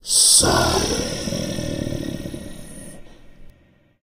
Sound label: vort heal single loop